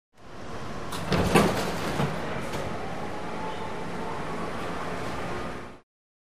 Close Up Subway Door

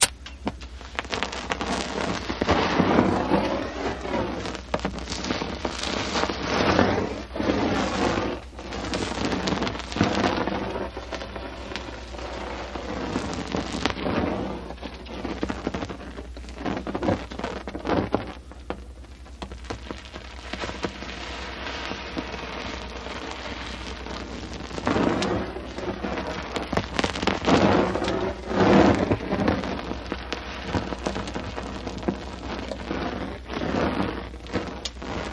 Rubber Cement Torch; torches

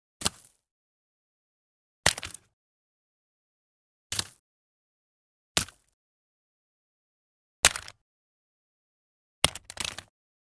22 Ruger Revolver, foley gun movement

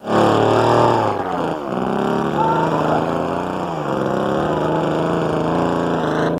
Chainsaw Rev Dist

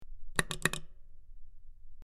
Button Press In Out Sp, switch clicks

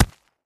Concrete Chunk Drop; rocks & dirt

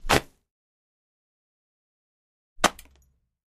Wet Clout Drops

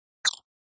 Button Hit On Small Ho

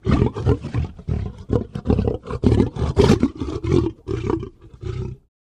Lion Growl Rapid Thro; big cats

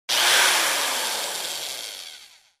Electric drill being turned on and off.; Tools, Hand Drill Motor, Drill

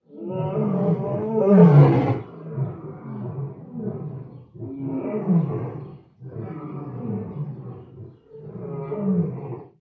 Lion Roar Multiple Ca; big cats

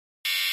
Buzzer Fst Resonant

